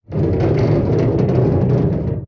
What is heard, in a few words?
damage vehicle; World Of Warships